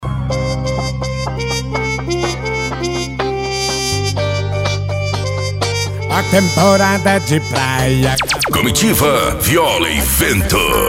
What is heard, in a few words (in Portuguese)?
comitiva viola e vento